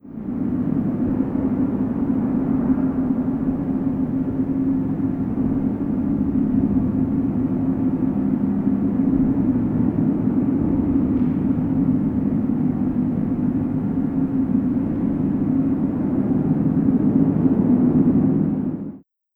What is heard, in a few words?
Laquinta Wind; Laquinta Wind1l